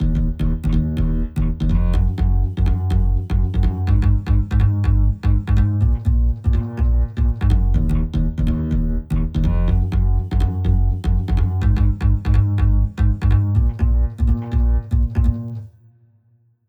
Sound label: bass